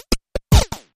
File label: Sharp Bonker